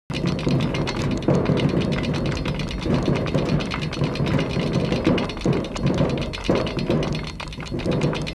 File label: mechanical robot moving; mixkit